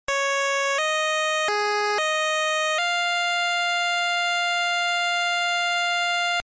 8 bit

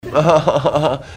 wahaha laugh

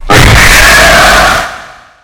screamer

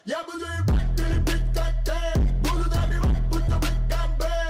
beat; squirrel

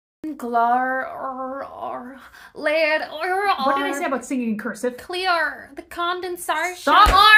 singing

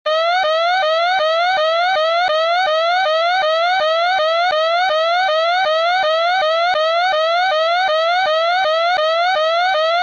crazy alarm

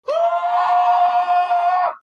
japanese gameshow host scream

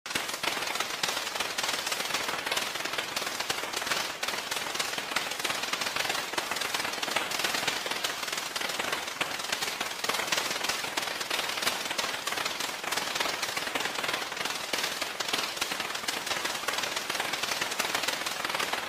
rain

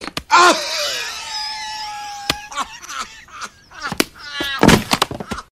laught; woody

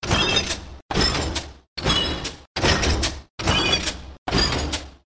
walking; atst

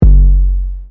bass

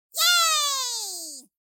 yaayy

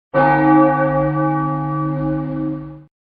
bell; phillies

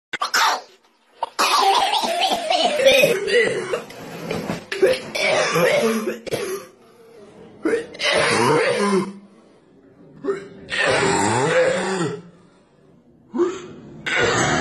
coughing

